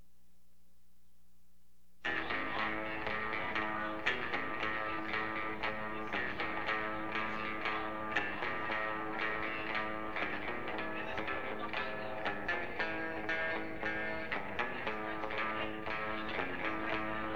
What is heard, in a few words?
Open Fire, Three Guitars